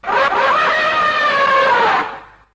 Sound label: Titanosaurus Roar; Showa